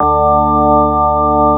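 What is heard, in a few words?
CX3 organ; USB Soundscan vol.09; AKAI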